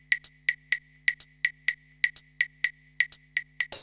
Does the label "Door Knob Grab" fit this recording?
No